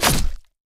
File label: snake segment explode; Ragnarokkr